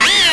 pain

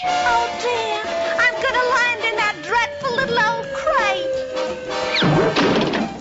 fall

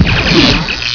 DROWN; attic

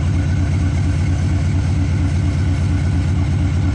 engine

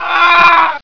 aaah

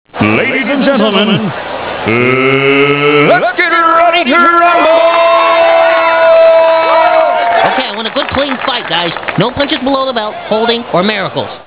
rumble; lmctf

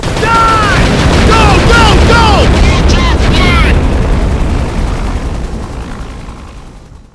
airstrike; spawngirlsounds

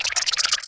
spore neutral

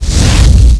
fireball explode

